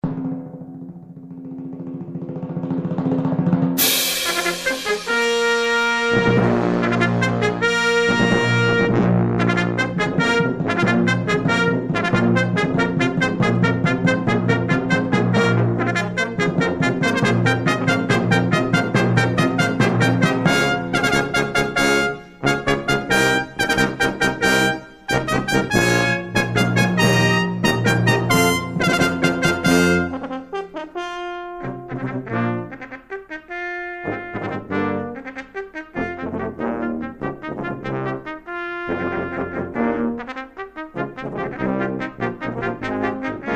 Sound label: Police Brass Intrade; kammermusik